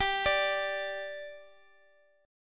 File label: G 5th G Guitar Drop D, chord